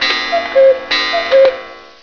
cuckoo, house sounds

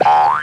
player long jump

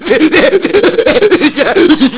haha

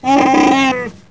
wookiee

